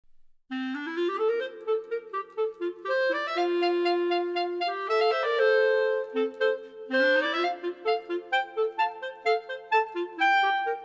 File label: Clarinet Duet; Clarinets B: (Duo)